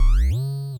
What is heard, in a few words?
8bit se